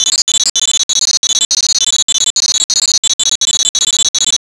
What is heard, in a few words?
BEEP; shaunsville